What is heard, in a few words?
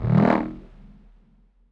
Wobble Eb